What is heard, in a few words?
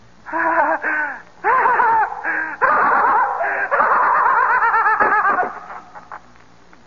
laughing corpse, male; MISC and SPOKEN WORD; VOCALS and SPOKEN WORD